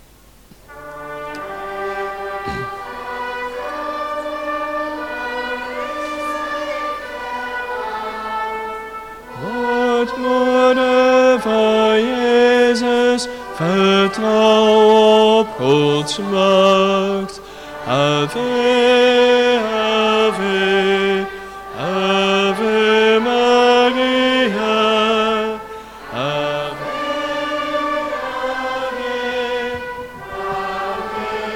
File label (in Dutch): marialiedje met trompet